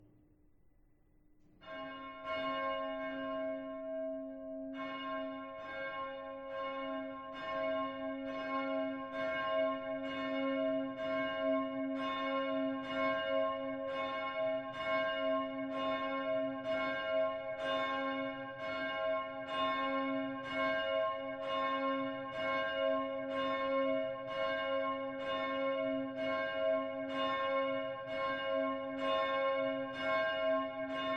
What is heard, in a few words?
bell; southtower; piusx